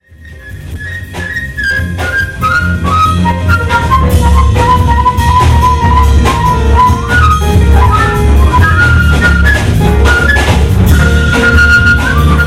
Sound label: Impro